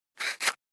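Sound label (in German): Streichholzschachtel oeffnen schliessen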